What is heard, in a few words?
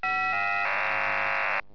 new qpsk